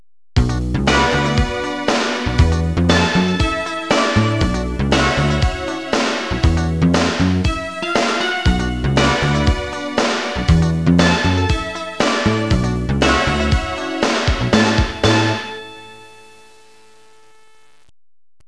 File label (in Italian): suonerie businessy